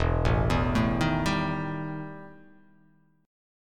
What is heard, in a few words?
E Minor Major 7th Double Flat 5th E; arpeggiated; JGuitar